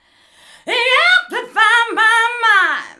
VOC, Disco Fever VOCALS

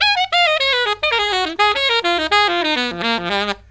SAX, Killer Brass Riffs; USB Soundscan vol.29 - Killer Brass Riffs; AKAI

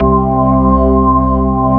ORGAN C1; AKAI S-Series CD-ROM Sound Library VOL-8

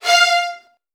F2, VN 16TH, Virtuoso Strings; ILIO; Kirk Hunter

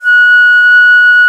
alto bon, India winds; E-MU Producer Series Vol. 7 – Old World Instruments

